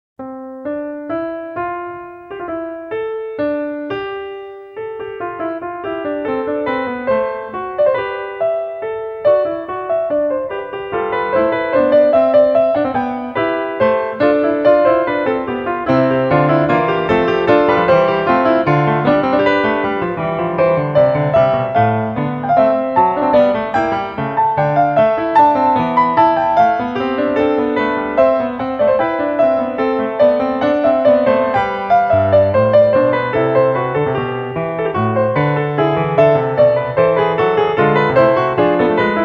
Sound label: fugue no 1 c major